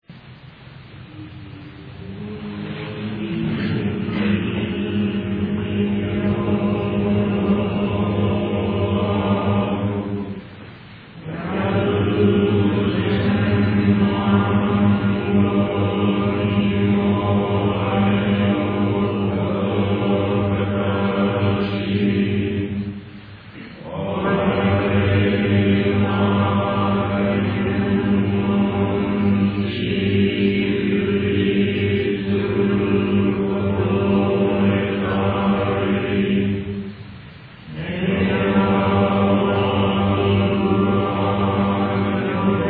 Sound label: chant